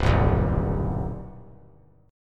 C Minor Major 7th C 3C,3F,3A,3D; strummed; JGuitar

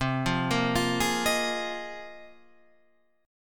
A sharp 7th Suspended 2nd Suspended 4th

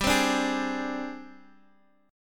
Ab Major 7th Flat 5th G, Guitar

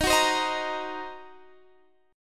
F sharp 6th Flat 5th, chord; x,18,16,17,16,18

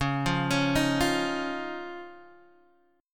Db Major Add 9th, Guitar, Open D

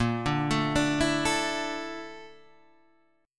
A sharp Major 7th Flat 5th, Guitar Standard; arpeggiated; JGuitar

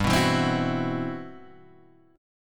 C Major Sharp 11th G

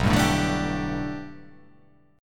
B Minor 7th Sharp 5th B, chord

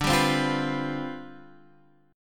C Major 7th Suspended 2nd Suspended 4th D, chord

